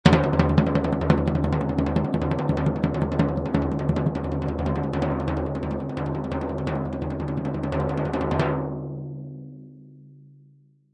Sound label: Cartoon Drum Tympani Pound; Comedy/Cartoon - Drum, Tympani Tap 01: Short rhythmic tapping; Funny Sounds